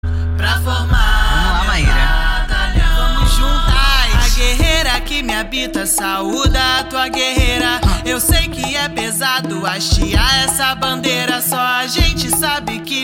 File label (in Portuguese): Funk